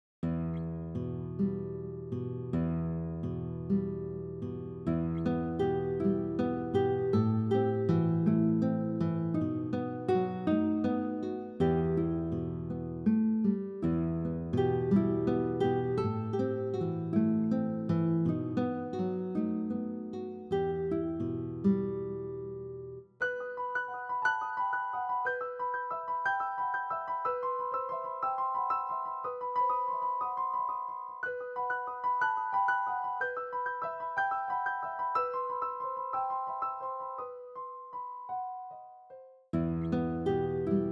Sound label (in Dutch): Watermuziek